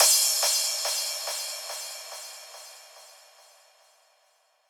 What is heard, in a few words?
PWM Kit 142 BPM Crash; Vengeance - Essential Clubsounds Vol.5; VEC5 Demo Constr Kits; Root Gmin; Drums & Perc